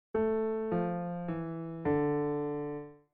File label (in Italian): viola